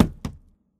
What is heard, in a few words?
phys cube, physics